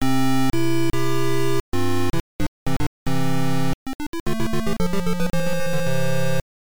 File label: Level Clear; Springer; arcade